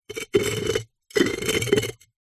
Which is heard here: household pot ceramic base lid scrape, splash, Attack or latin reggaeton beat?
household pot ceramic base lid scrape